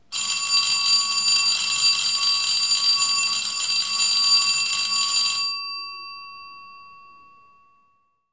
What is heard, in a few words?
Electric School Bell Ring Break